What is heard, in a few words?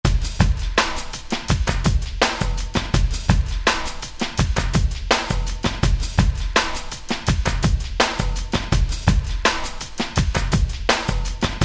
Hip Hop DJ; Roland SR-JV80-12 Hip Hop Collection